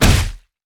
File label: basicattack; LoL; trundle